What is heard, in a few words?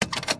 E Brake Release